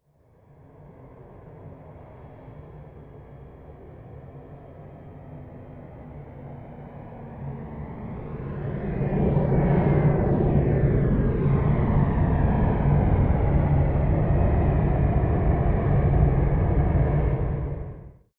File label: plane cessna private flyby left to right distant; flugzeuge verkehrsflugzeuge; verkehr transport